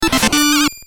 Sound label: new item equip